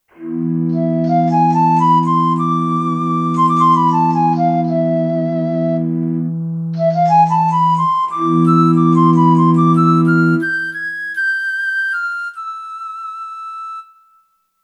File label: Orient Wind, Kurzweil K2500X; Factory Programs